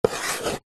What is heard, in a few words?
chalk stick thick draw circle on small chalk board; office